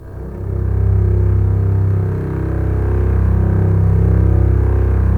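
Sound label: Strings contrabasses; Roland - LA Composer vol. 4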